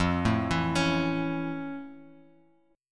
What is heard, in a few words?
F Augmented Major 7th, Bass Standard; F+M7 arpeggiated; 13,12,14,18; JGuitar